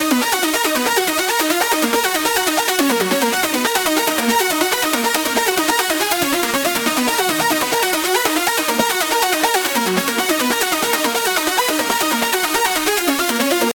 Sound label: supersaw, complex, epic, arp, D# minor, 8 bars, 140BPM; Audialab EDM Elements